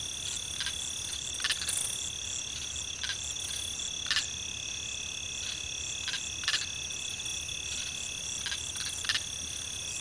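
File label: insect loop; DAoC; Toa; Gamestar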